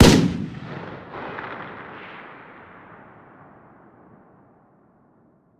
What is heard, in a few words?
fire dist, m16 weapons